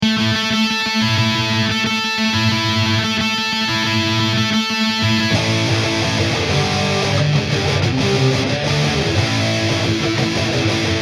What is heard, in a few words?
Guitars